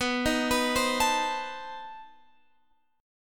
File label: B 7th Flat 9th B Guitar Open F; B7b9; arpeggiated